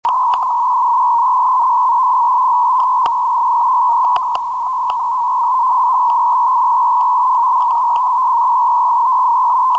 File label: 24khz naa usa